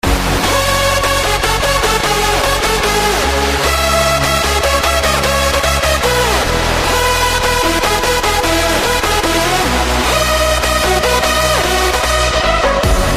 Best Hardstyle

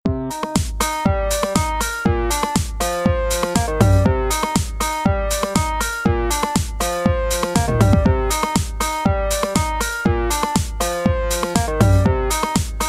Dance Music

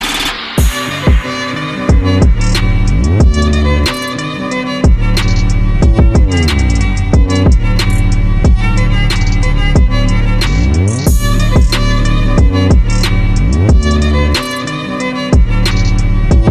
Remix

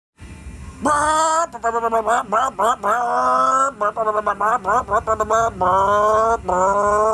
Chainsaw Brap

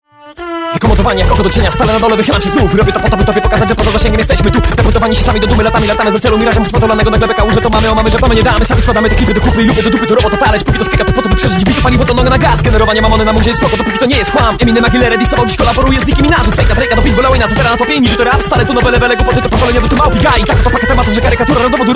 Rap

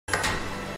heel click; Satsuki

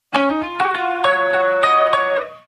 Riff

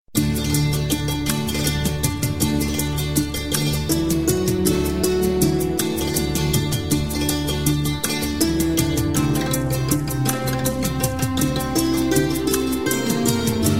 Santoor

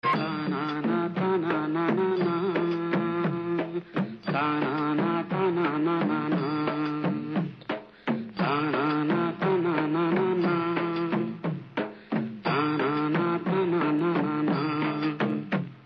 Vocals; Malgudi

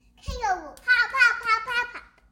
Kangaroo hop

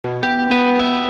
Familiar Taste riff

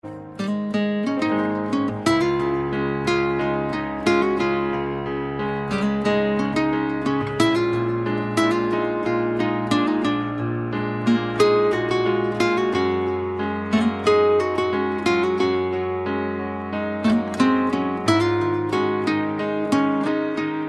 Beautiful Melody

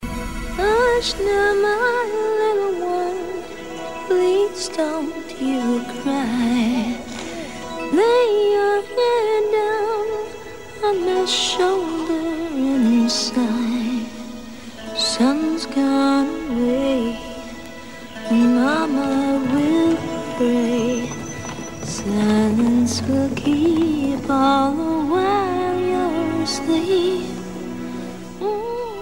Lullaby

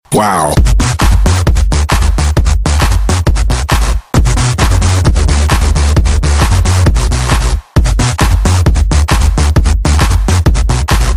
Hardstyle

